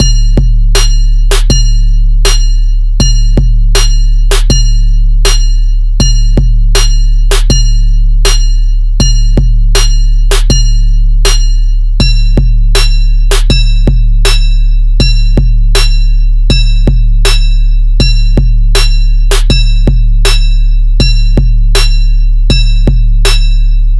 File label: beat